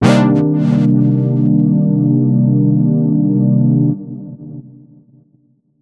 chord H3000

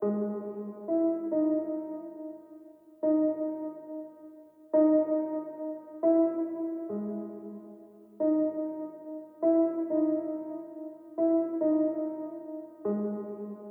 Emaj Rotten Piano

